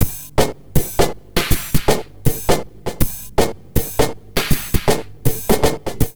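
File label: neuro, drum loops crazy processed, 80 bpm; USB Soundscan vol.07; AKAI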